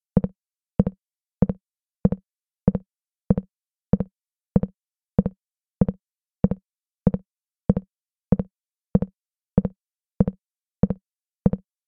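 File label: wood, Walking Sound; The NIW Project; NIW: Natural interactive walking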